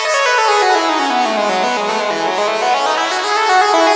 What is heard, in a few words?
xtra lead